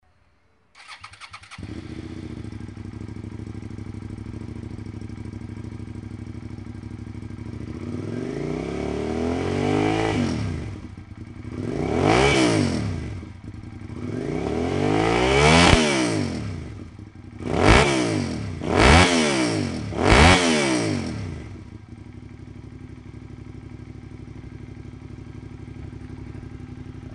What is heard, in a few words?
sv650